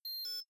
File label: bot default, robot